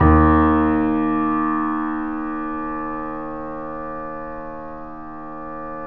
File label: 3D Pianos Yamaha C7; E-MU Producer Series Vol. 5